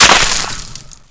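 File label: ricochet dirt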